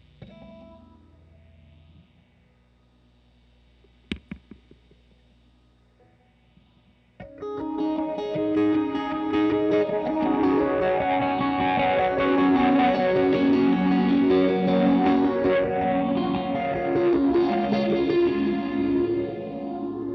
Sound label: squire2